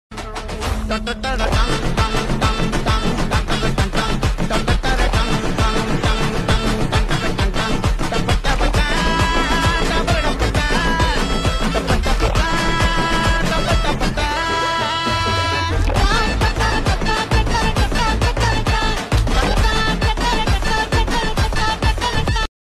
song ringtone, bgm; kalki; prabhas

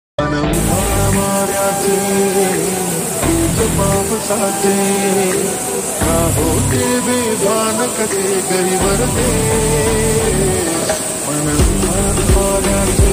song

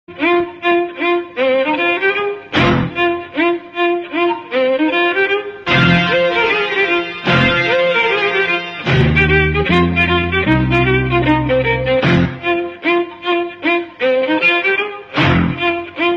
bgm songs music tamil bgm tamil ringtone

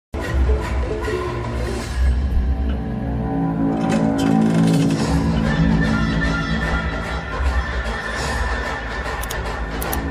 villian entry bgm ringtone; saripodhaa sanivaaram movie

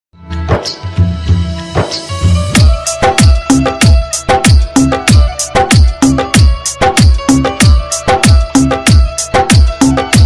desh bhakti song republic day mix ringtone hindi

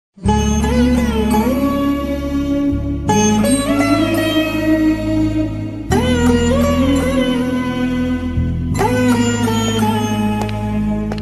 emotional bgm ringtones; nuvu vasthanuante nenu vaddantana

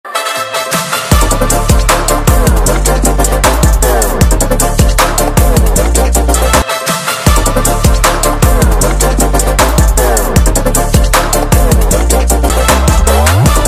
song bgm ringtone; ram charan

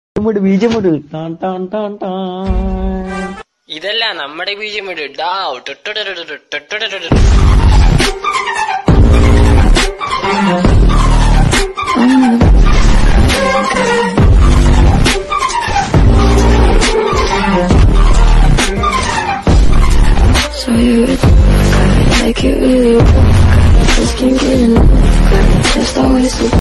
pubg bgm vs freefire bgm

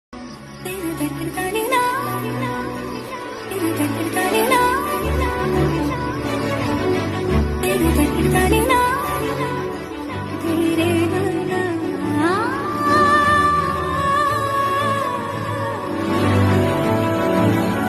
love bgm indian traditional

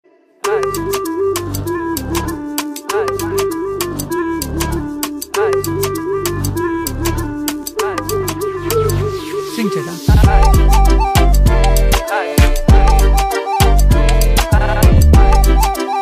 ringtone bgm; premalu; mamitha; naslen

